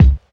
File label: warm steel kick drum sample c sharp key, Royality free bass drum sample tuned to the C# note